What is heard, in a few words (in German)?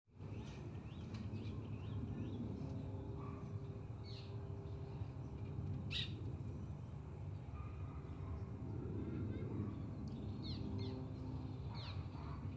stadtpark vögel flugeuge etc; Großstadtgeraeusche